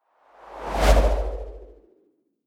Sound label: whoosh impact soft wobble bass high